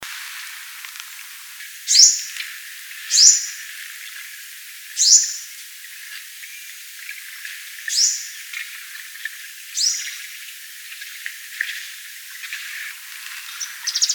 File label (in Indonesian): Burung Madu Kelapa Betina